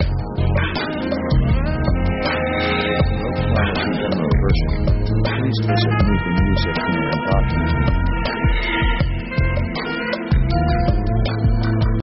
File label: atmosphere; Basically slow